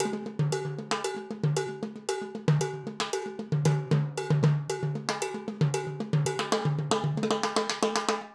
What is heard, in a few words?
Timbale, acoustic percussion loop; Drums & Percussion; Percussion Loops